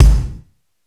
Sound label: Rock kick; Reference Beat